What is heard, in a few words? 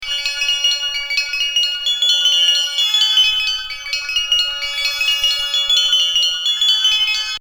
Chime